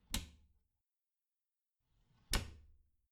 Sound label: bathroom cabinet door creak close regular